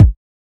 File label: old school short reverb steel kick drum sound g sharp key